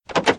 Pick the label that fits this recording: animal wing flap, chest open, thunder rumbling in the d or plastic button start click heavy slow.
chest open